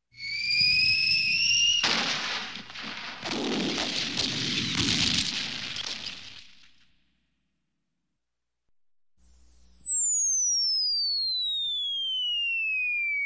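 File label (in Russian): эффект падения и взрыва бомбы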